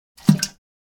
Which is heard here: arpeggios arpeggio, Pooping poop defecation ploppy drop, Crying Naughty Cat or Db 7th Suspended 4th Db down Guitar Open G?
Pooping poop defecation ploppy drop